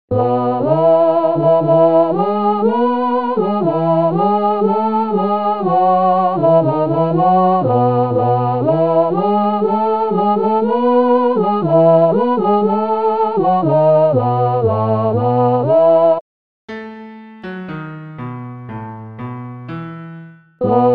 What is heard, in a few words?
All voices; Avondale